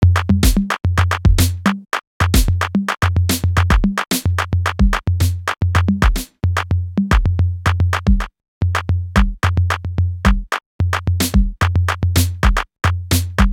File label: drum machine song